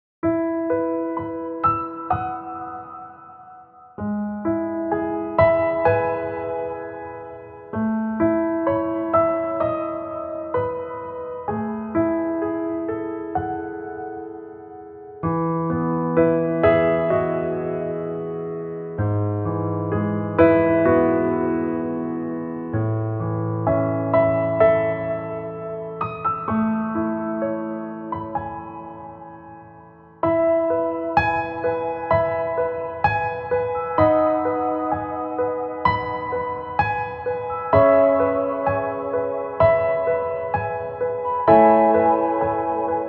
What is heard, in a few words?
Remember You Piano